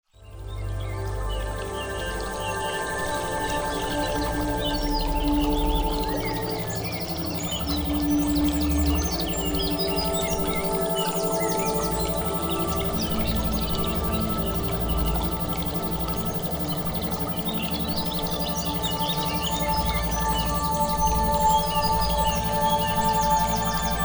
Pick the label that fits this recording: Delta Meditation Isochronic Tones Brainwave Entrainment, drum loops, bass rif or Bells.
Delta Meditation Isochronic Tones Brainwave Entrainment